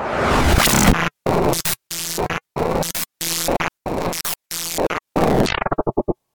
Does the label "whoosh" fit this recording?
Yes